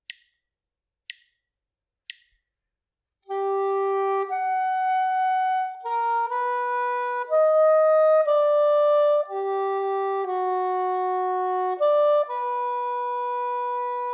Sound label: melodic dictation; ear training